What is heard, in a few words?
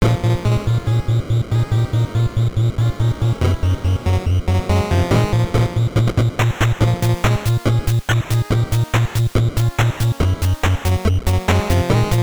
Factory; Toxic Crusaders; NES; Level 3 - Tromaville Factory